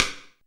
SD RI, SD Rim; Sound & Vision - Gigapack I

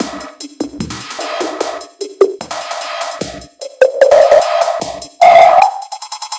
Loop; Sonic Foundry (Sony Creative Software); Esoterik Beatz

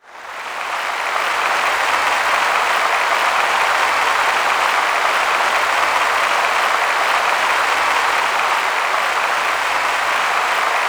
C3, SynthLine; 90 s Sample CDs; NiceBeats; SynthLine VOL-10

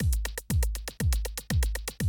120, Classic Drum Loops

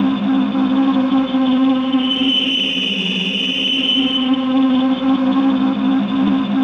BRITE BUZZ; Distored Reality

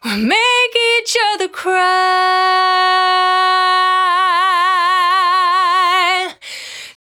Cry 68bpm B, Vocal; Zero-G

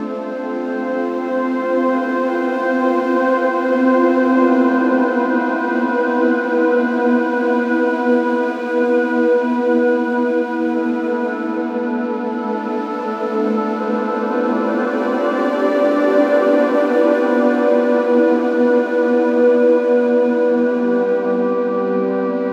Flute Loop Harmo

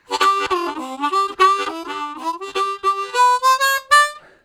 Harmonica, WAV Riffs; Zero G

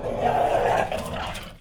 DOG; 3D Sound - 3D Keys VOL-1; MEAN 3D

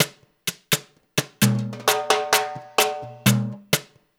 LOOP, Percussion, Danzon; InVision Interactive; Percussion Slam

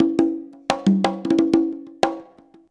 CONGA, Modern Percussion Loops; USB Soundscan vol.56 - Modern Percussion Loops; AKAI

